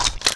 scope

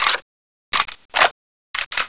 steyr aug reload

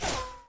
bullet by

